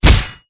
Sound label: Staff Shell, Damage